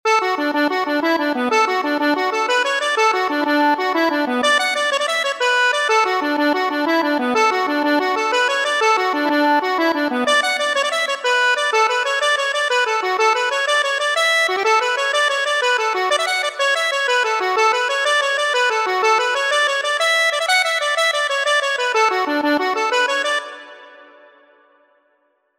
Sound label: accordion